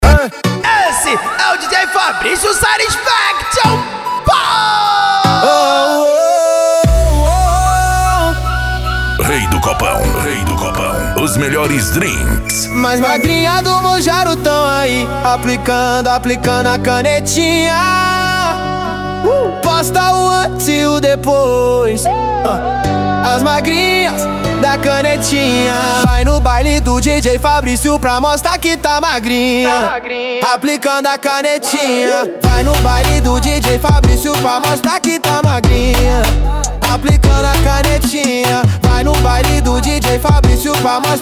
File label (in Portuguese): funk ritmado